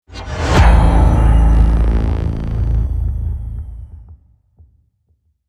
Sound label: Krypton Hit Deep; Ninja Tracks